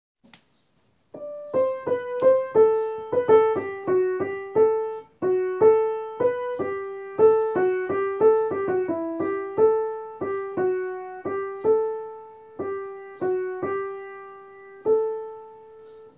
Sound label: mezzo; maria virgo virginum